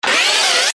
science fiction robot servo motor whirr operate movement